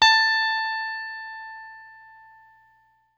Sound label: BLUE POP, Guitar & Bass